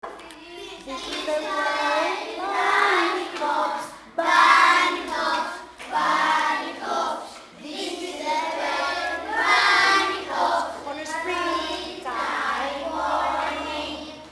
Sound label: Spring song